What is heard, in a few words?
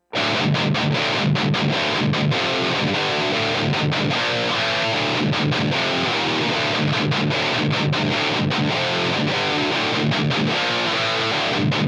Trident I Ceramic 6 String